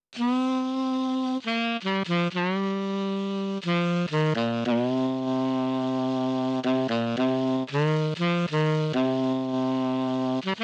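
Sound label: mellotron alto sax dry sample; clavia nord wave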